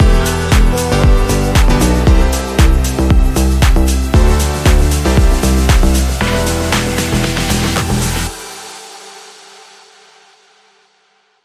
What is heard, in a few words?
Lounge Sting